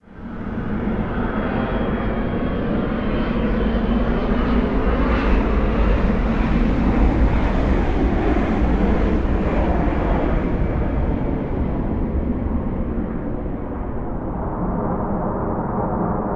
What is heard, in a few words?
airplane; ambient; Counter Strike Model Pack; CS:GO; nuke